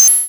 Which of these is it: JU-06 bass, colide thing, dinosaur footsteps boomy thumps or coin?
coin